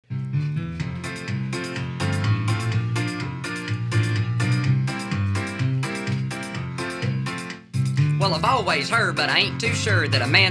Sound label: choir